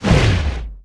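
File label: nova electric, spells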